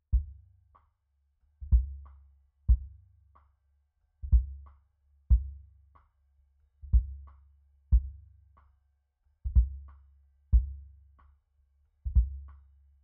kick sub

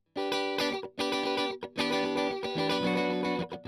Strum

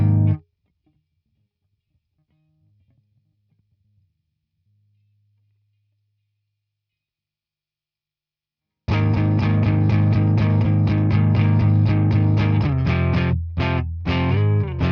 Guitar

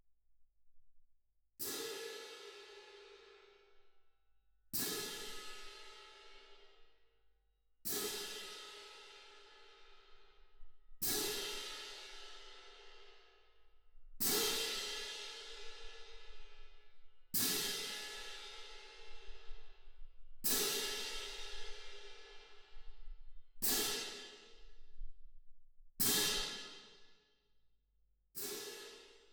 Twin R Perc

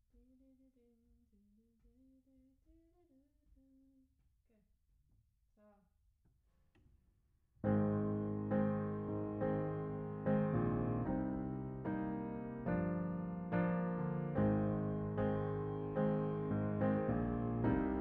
Piano Mono

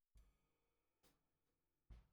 SNR Bot

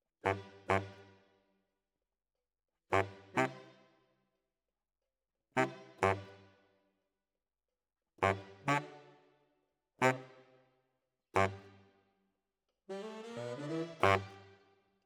Bari, RNR for piano

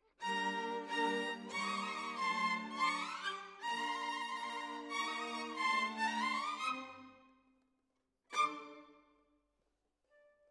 Violins, jazz for piano

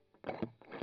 Strum Line

